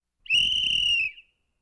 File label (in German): Pfeife; Sport